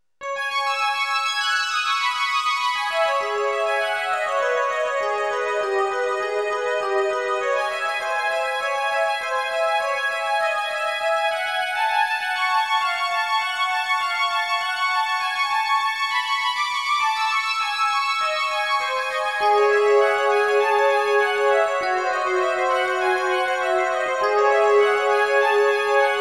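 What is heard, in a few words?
Preludes No. 8 in C minor, piano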